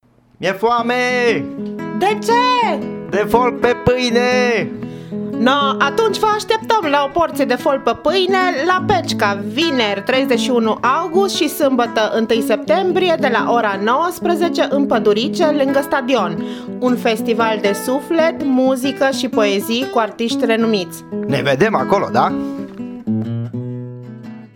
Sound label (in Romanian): Folk